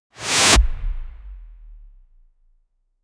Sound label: fast whoosh long footstep; speed